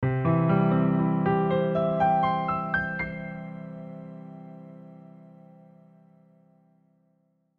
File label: Piano Arpeggio, Piano Arpeggio Ascend Sustained; Piano Arpeggio Ascend Sustained Slow; Piano Sound Effects; Blastwave